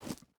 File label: footstep boots gravel jump start; Rust